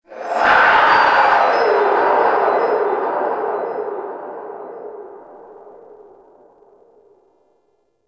Stadium Infested Medium

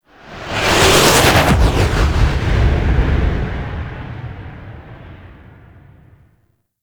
Ship Fly By Slow; Vehicles; Warframe; Liset; Liset Flight; Grineer Ship Liset

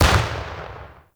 Vial Ability Blast Shatter; Warframe; Warframes; Alchemist; Elements

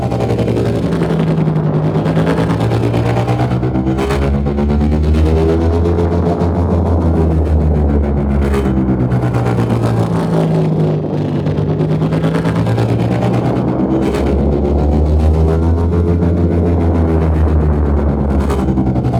Ship Rotation Speed Thruster; RailJack Ship

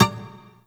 Shawzin Wrong Note D; Warframe

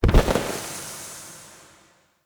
Staff Caster Proj Destroy, Weapons; Player; Staves; Galene

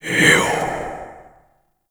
Fire Whispers; Warframe; Tenno; Grimoire

